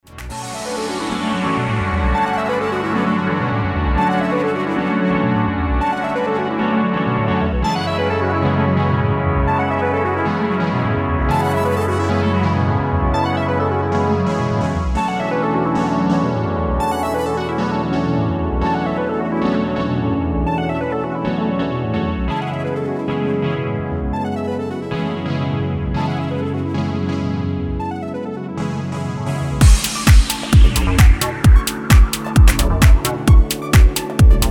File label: space bass; short-circuits